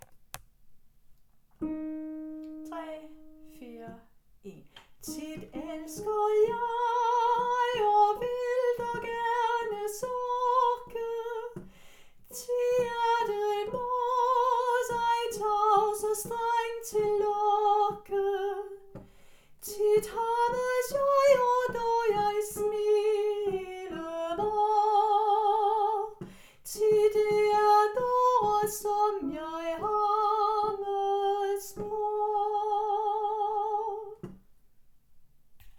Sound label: Tit er jeg glad SOPRAN